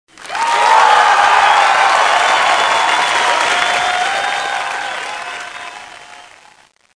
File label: 愉快 applause